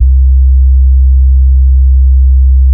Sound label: sub